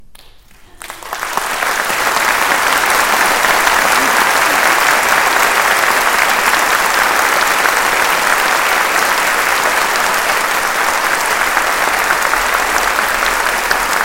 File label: long clapping